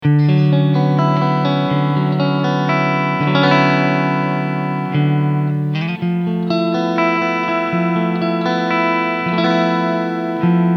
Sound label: clean; two notes le